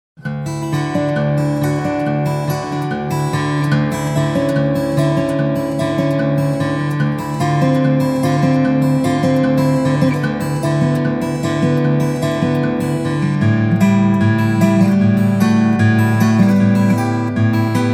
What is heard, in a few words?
Guitar